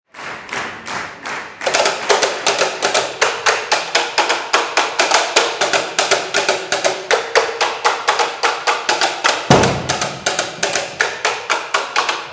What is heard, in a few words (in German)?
Tambouren Zugabe